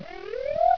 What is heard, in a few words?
alert, houndeye